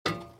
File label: climb, fps navigation; openspace3d